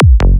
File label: sequence kick melo 148 bpm